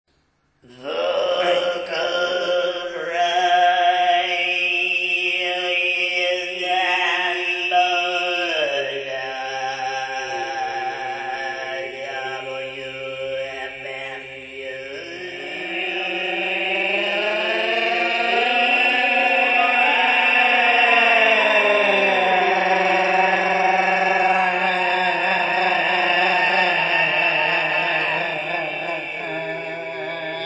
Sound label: Vocal Fry Theme